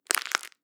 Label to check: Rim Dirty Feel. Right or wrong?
Wrong